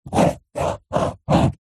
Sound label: pen ballpoint paper hollow table draw square